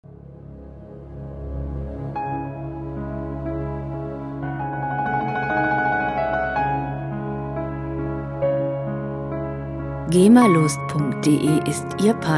der pianist

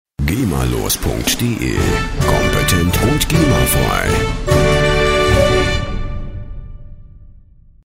karneval tusch

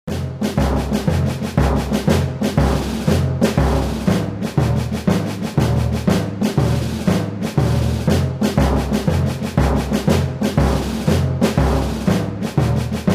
militaer

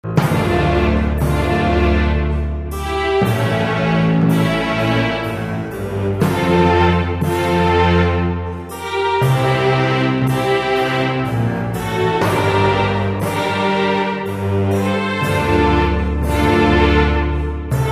sarabande in d moll

